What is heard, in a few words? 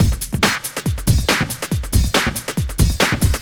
Loops BB 140, BreakBeats; Vengeance Samples